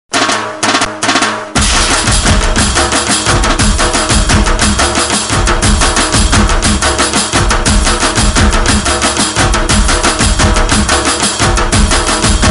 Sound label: 3d Desi Beats